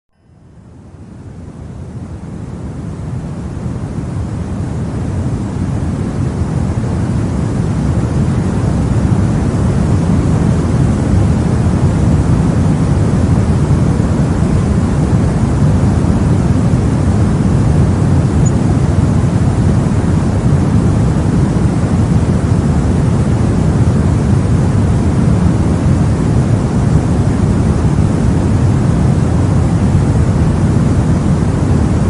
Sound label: Soft Noise; Dental Support; Rife frequencies